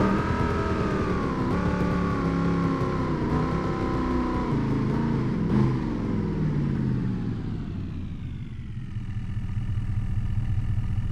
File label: throttle off, vehicles; tahoe